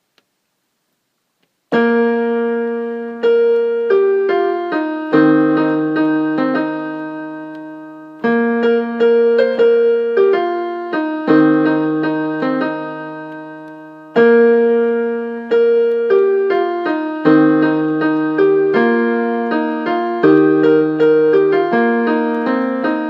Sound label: cover on keyboard